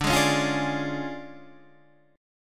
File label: D Minor Major 7th Flat 5th D Guitar Open D